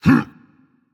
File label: Grunt Jump Dragon; fafnir